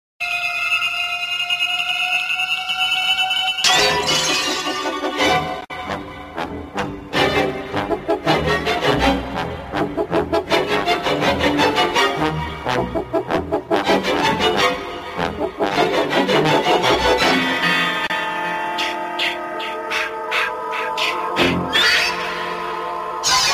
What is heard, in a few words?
scary film music